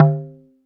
DM5 Perc, Alesis DM5 drum machine

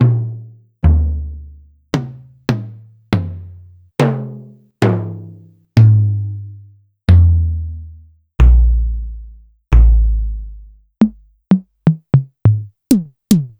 Medeli DD-5061

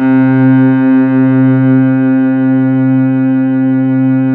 CELLOS C3-R, CELLOS

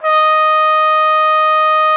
D#5, TRUMPET; Xsample VOL-3

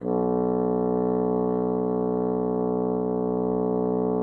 bassoon, B1; Xsample VOL-6

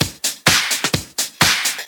Clubhouse Loop 128 BPM

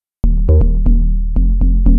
Bass Line; Eclectic Electronica

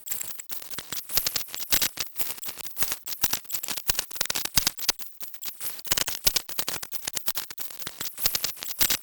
mad radio, special FX twisted; USB Soundscan; AKAI

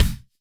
BD; Best Service; Dance Mega Drums

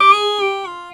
Talk Box phrase

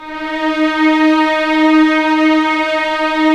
strings, orchestral f slow; Roland LCDP13

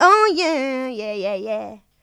Vocals, Adlibs; Houseworx

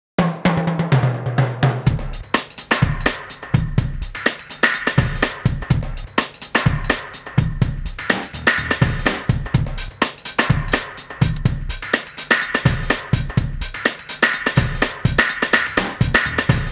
Dubhouse 125; AMG - Ruff Cutz

